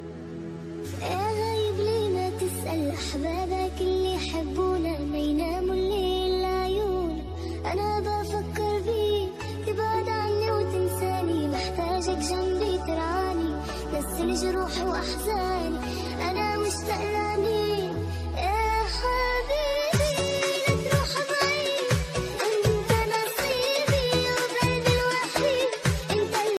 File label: arabic remix ringtone